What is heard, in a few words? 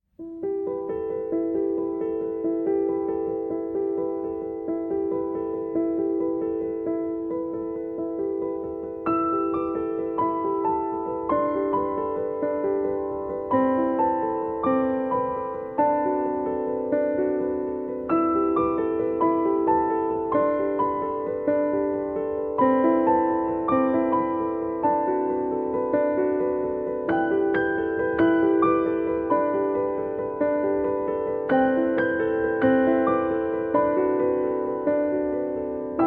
Renaissance Piano Version; Poëta Minora